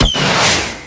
rocket launch tiny

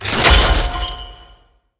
chains trap enemy

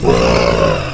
zombie walker kid

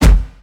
enemy appear smoke

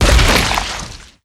head explode; pestilence

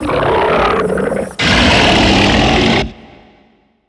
beast vocal ghost attack roar

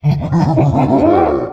mother hand boil attack start; finalmother

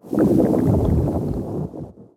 growl large; fistula

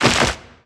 bighorn big hole shake

